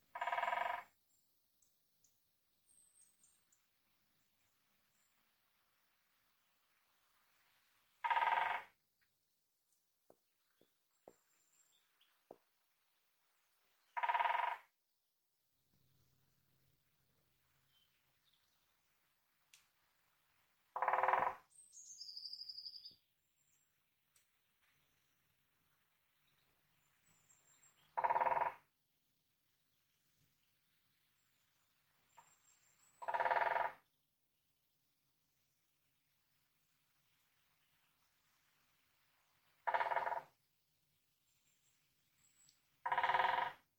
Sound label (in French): variations tambourinage Pic epeiche